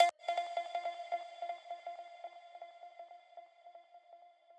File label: arp